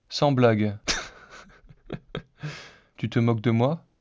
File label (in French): rire synthese basique